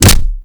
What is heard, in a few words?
Generic Ability Impact; Warframe; Warframes